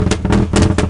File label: POPS, CAR